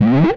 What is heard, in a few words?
Beep; MMRetroArcadeSoundsPack